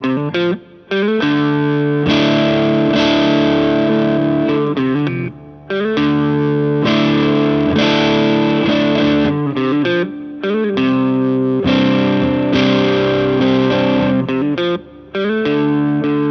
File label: Music Man Mariposa both